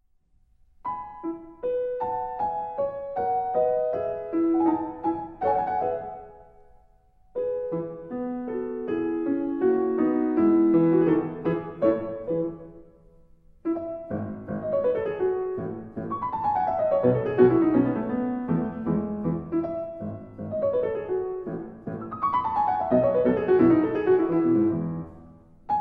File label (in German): Sonate